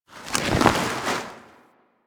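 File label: Settlement Cloth random ambience; Warframe; Grineer Settlement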